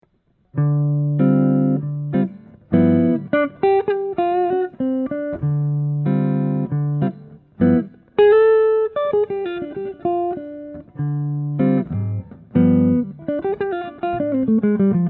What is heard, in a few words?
peerless hardtail es 335; natural